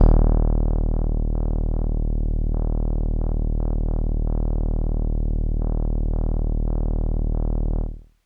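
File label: Kawaii D#5; Randroid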